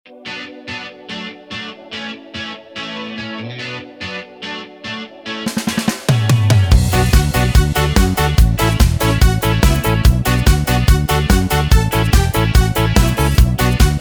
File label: Style Yamaha; yamaha psr sx600